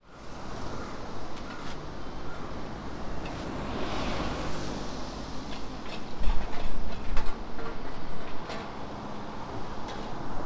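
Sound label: shinhama cho, Fukushima Soundscape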